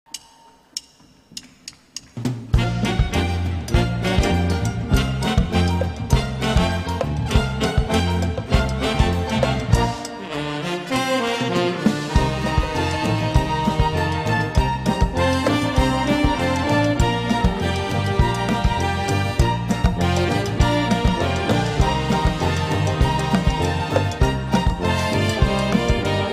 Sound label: samba live orchestra